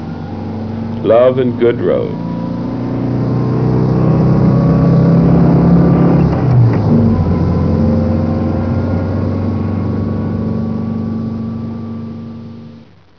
closing; Muir

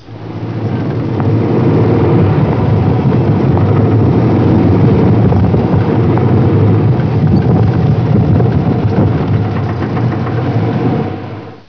pinging; Muir

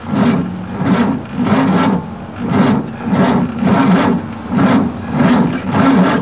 grind; Muir

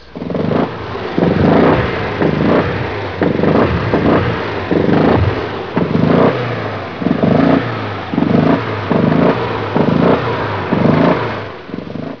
loose head; Loose Heads; Muir

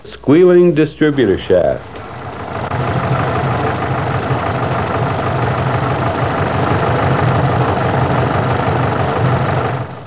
squeal dist; Squealing Distributor Shaft; Muir

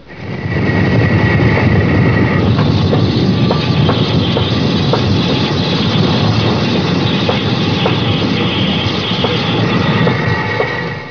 worn brakes; Muir

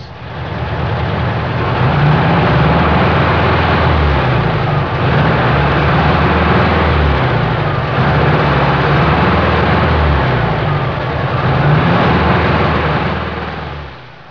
high rpm miss; Muir

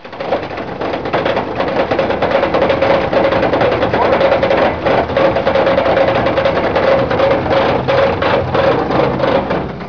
broken crank; Broken Crankshaft; Muir

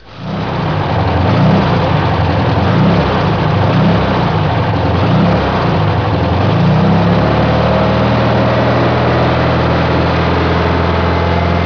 norm engine; Good Running Engine; Muir

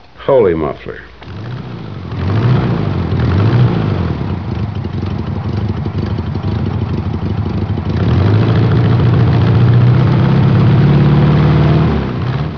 holy muffler; Holey Muffler; Muir

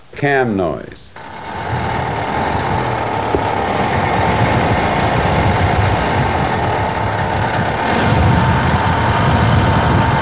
cam noise; Muir

